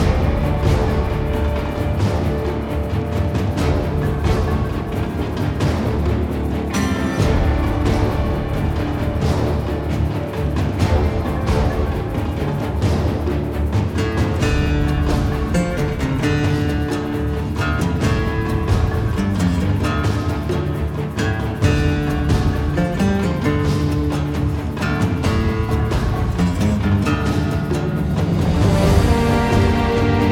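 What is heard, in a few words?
background music battle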